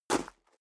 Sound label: step high heels gravel medium single stair up; schritte; Footsteps; menschen